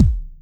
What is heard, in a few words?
Kicks